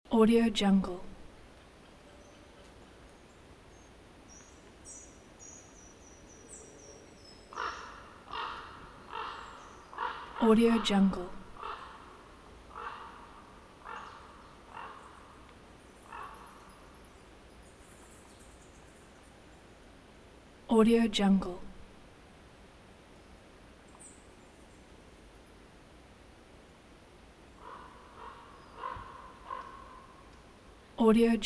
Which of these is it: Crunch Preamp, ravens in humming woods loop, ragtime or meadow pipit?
ravens in humming woods loop